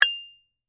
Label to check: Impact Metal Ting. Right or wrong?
Right